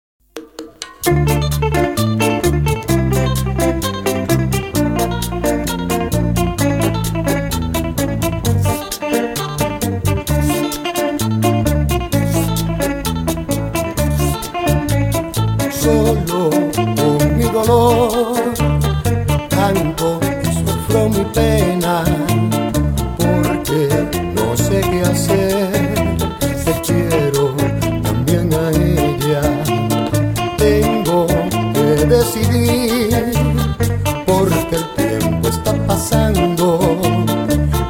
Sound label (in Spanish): Bachata